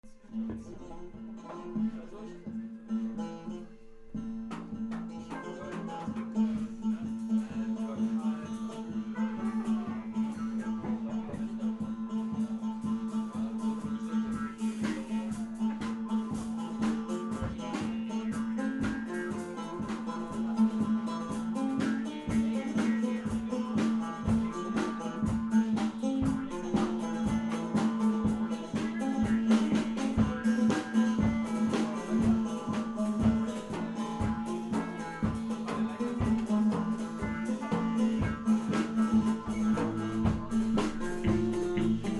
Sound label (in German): mit Tambour & Maultrommel